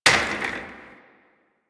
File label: Office Chair Impact; Nineteen Ninety Nine